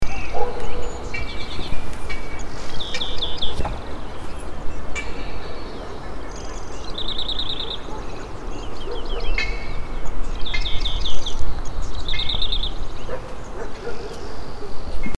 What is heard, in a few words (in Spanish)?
AUN MAS PAJAROS